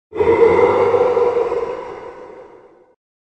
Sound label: ghost